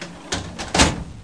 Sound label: file cabinet close; Sound FX Real Life